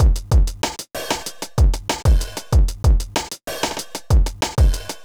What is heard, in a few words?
kit; Sound Effect Library